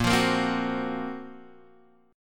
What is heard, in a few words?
Gb Major 7th Flat 5th